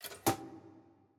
ambience room bucket training

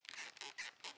ambience room lair work layer

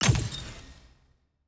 block destruction glass

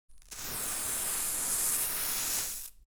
ambience room butchery working layer

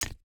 ui click

